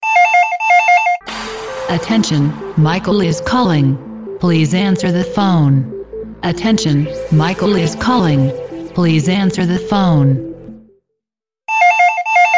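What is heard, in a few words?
Is Calling R&B, ringtones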